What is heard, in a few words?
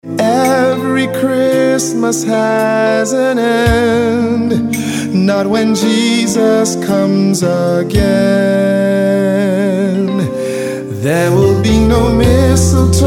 Christmas Ringtone